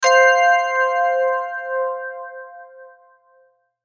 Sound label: Stab Bright, Stab Bright Musical Tonal Bell Octaves; Stabs